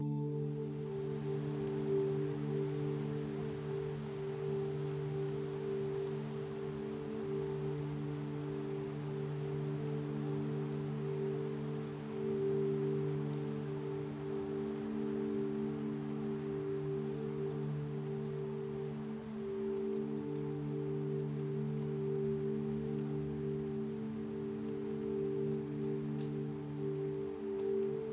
Magical Ambiance Loop

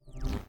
Timer Restart Rev

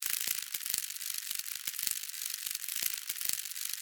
Scarab fly

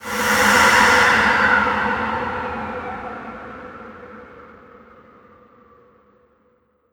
Dark Magus Impair Wind

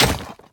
Stone Sword Hit

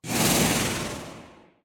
Finalboss Teleporton

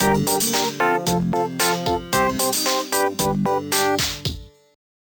Transition

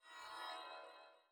Boss Rush Teleport

